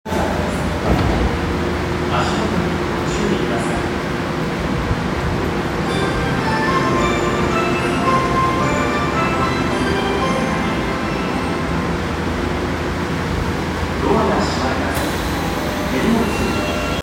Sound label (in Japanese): 東西線神楽坂西, train sounds